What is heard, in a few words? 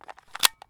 mp7 mag in; Escape from Tarkov